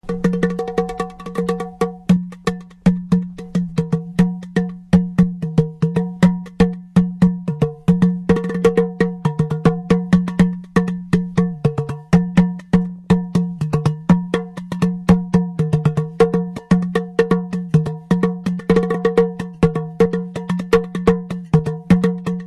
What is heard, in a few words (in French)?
arrosoir; Divers tambours et sculptures sonores en argile